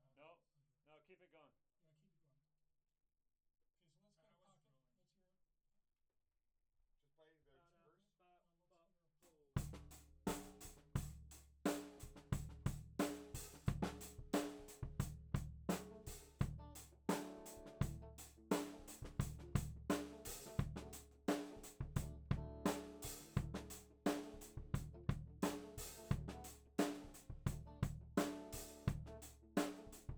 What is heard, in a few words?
Floor